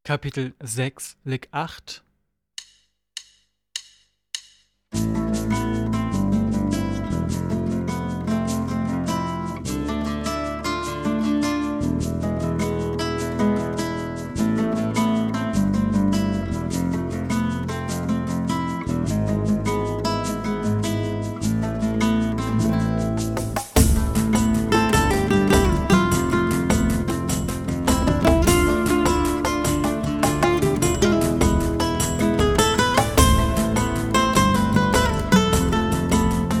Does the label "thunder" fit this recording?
No